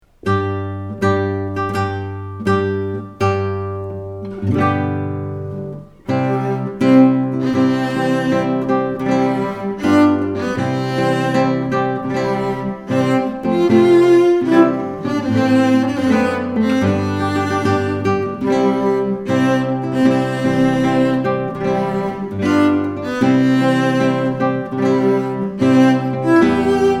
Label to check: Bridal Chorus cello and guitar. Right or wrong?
Right